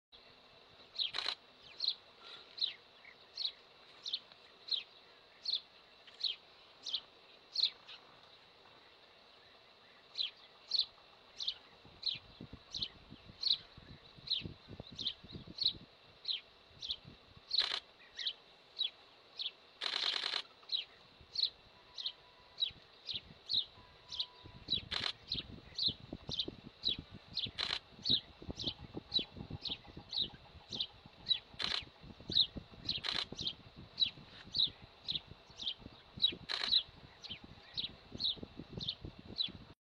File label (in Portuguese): canto do picanco nubio